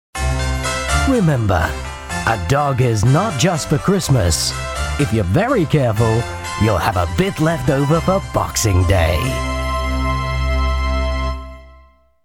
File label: G Christmas Jingles